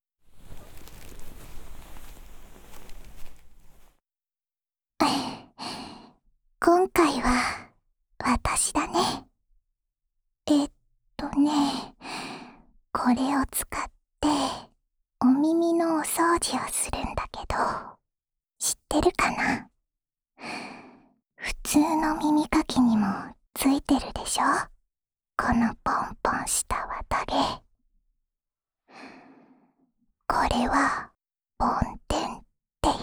掏耳_右, ASMR; 梵天

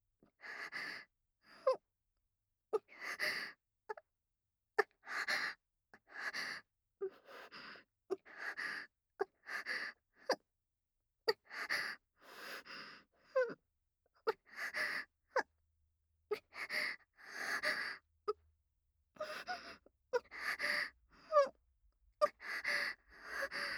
娇喘声 弱

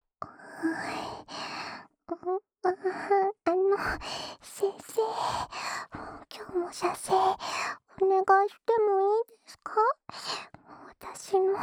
辅助自慰用 深深的舔耳 右耳

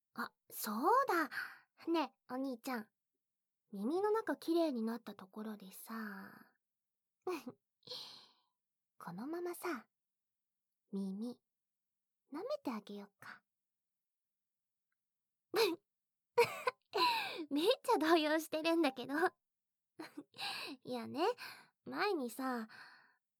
舔耳; 无効果音

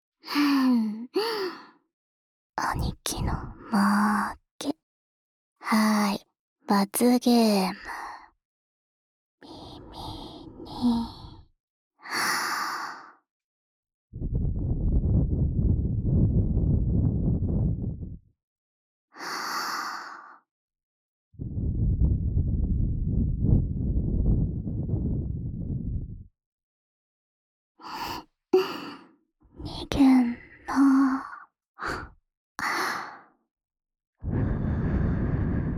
吹耳朵, ASMR

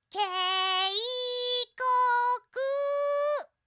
訊息 警示

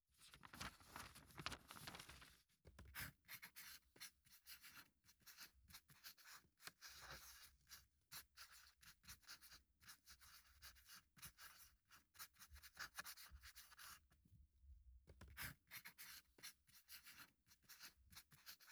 用飞机杯撸出来; 偷偷离开学习交流会，在厕所里

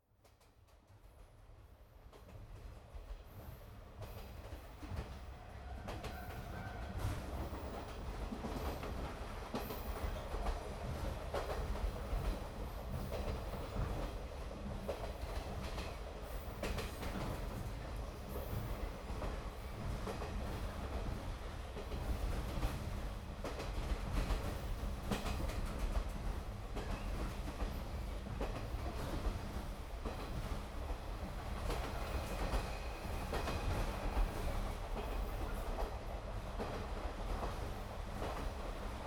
舊型客運車廂內的風扇轉動聲與列車行駛聲; 蓄音 萬岡; 悠閑地乘坐客運車廂

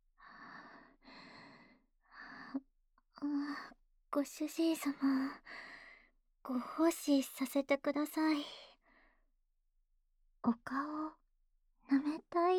舔舐脸部

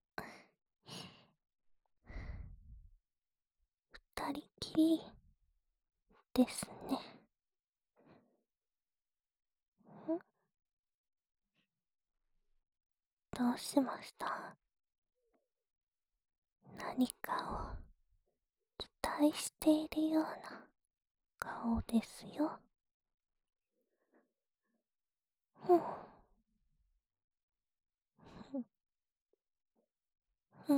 即兴舔耳（右耳）

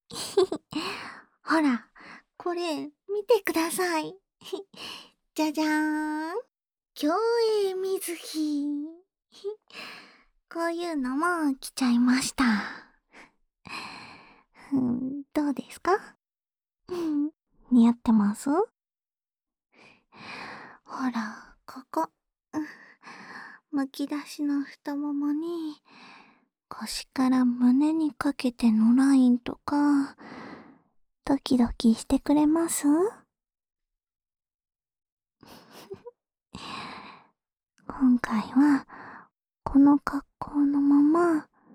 吹吹耳朵 右, ASMR

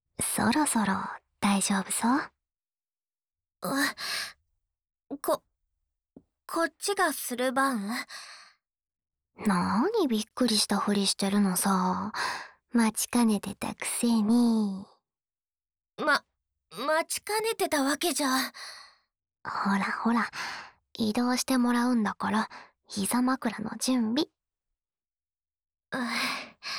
交換膝枕～夏帆的挖耳

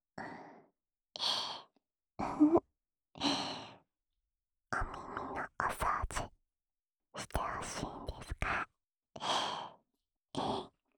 安眠用 掏耳循环（左耳）; 简体

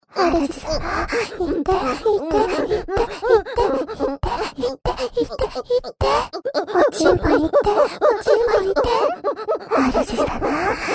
主人♪ 肉棒射射♪ 肉棒快射射～～♪＋哦齁嬌喘 SE無; 自慰輔助用特典音聲

